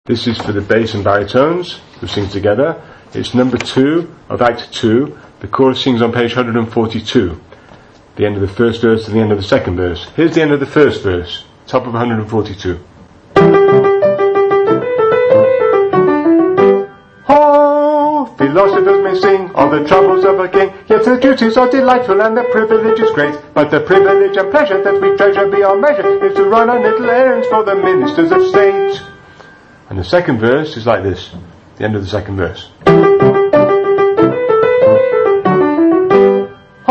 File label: bass baritone; gondoliers